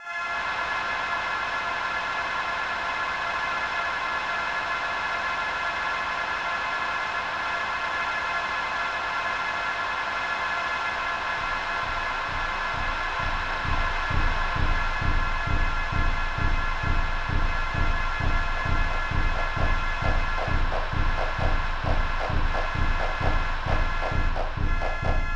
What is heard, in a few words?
Soccer Game Drumbeat